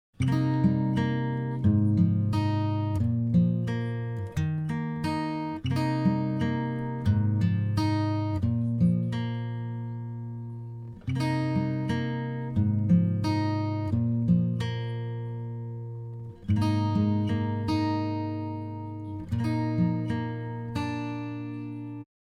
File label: Arp